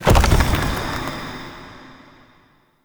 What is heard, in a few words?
Chest Stone Coffin Open Start; Objects